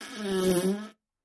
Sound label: Bowl Fart; farts